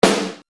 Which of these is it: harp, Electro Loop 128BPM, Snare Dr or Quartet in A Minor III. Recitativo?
Snare Dr